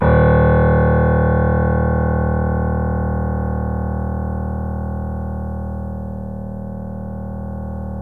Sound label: D#, The Piano; InVision Interactive - The Piano